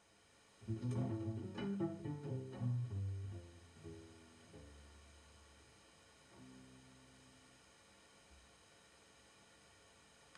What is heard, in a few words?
2 cellos and piano; Birthday concert